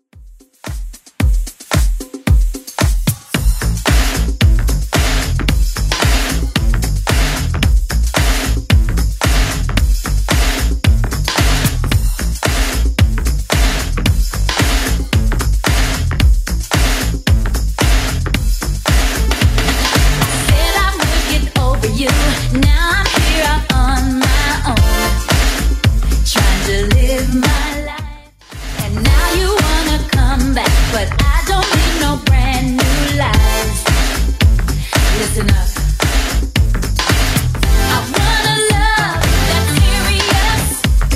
80s Club 112 bpm

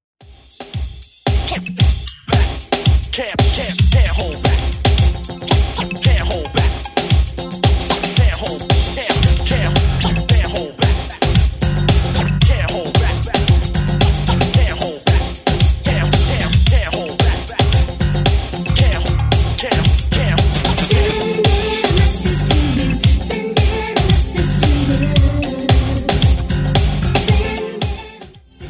80s 113bpm; Redrum

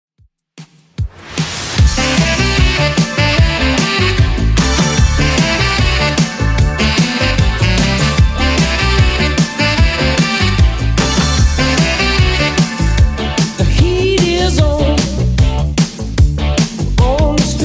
80s, 150bpm

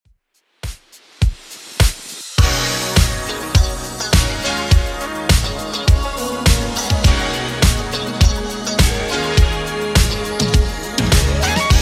80s Redrum 103bpm

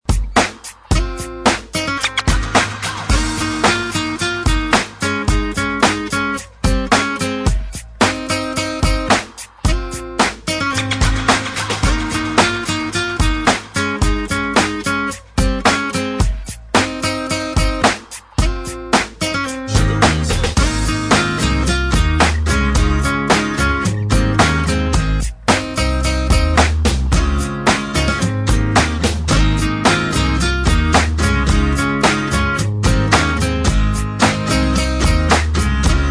90s Rock

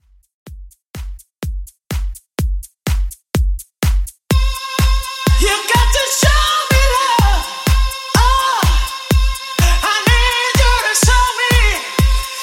Extended Mix 125Bpm

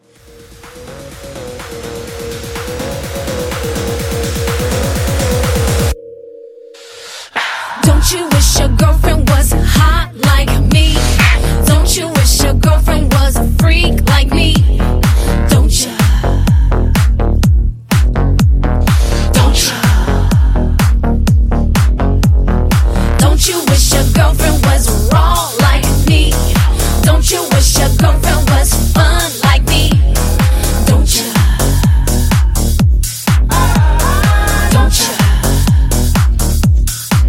House Mashup 125

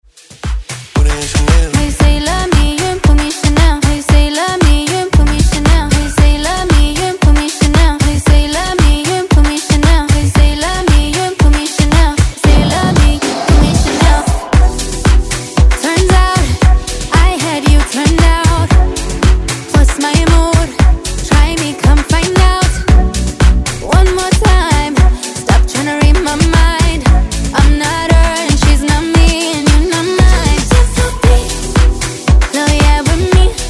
Afro Dance Redrum

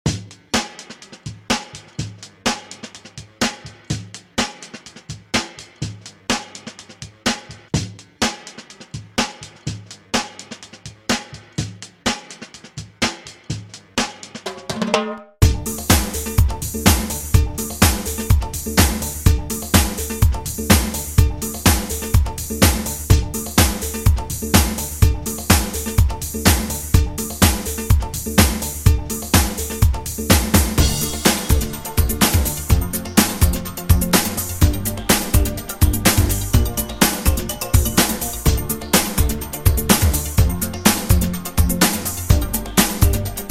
Funk 80s Redrum 125Bpm

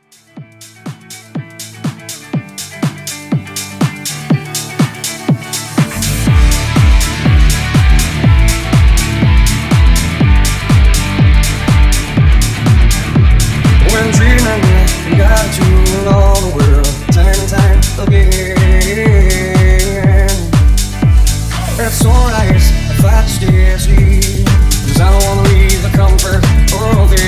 90s Rock House Mix; Remixfreakz

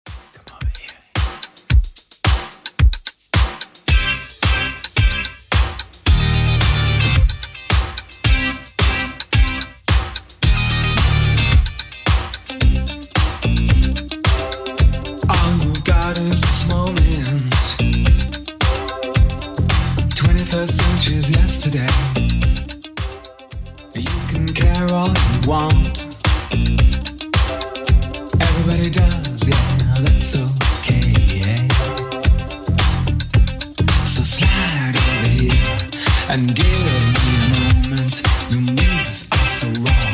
80s 110bpm; Redrum

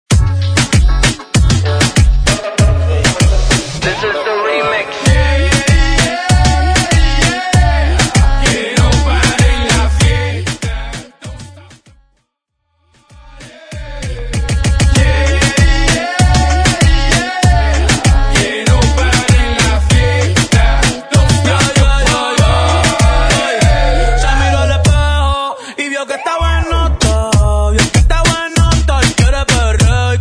Party Hype

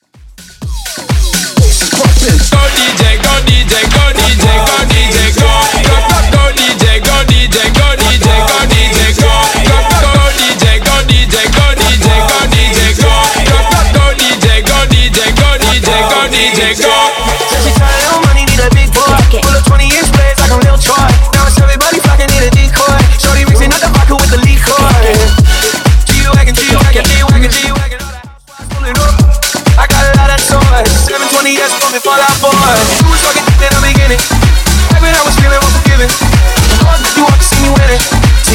Top40 Dancemix Mashup 126bpm

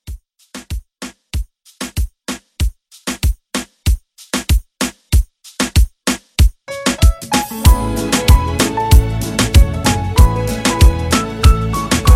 Moombah Re Drum 95 CLEAN

